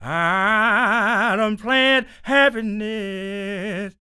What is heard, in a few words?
SING, Blues Soul; Spectrasonics Vocal Planet